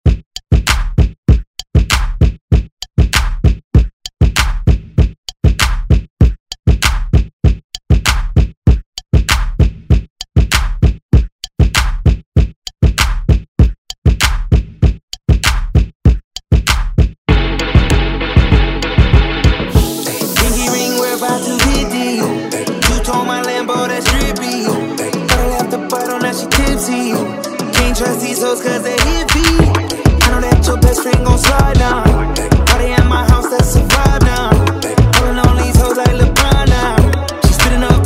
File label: Top40 Redrum